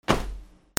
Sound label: FALLING